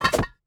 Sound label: object grab ceramic